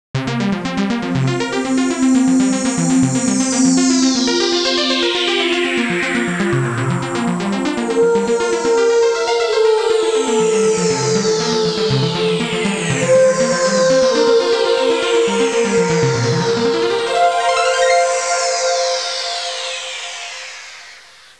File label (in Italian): musica newage